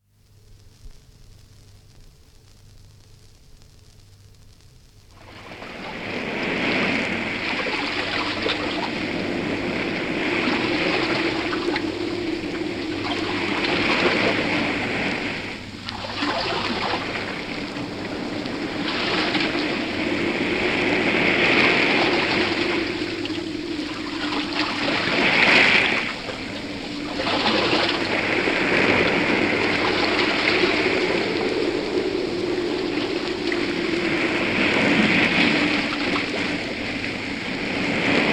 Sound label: Waves lapping an shore, from 78 disc; Ocean; Nature; BBC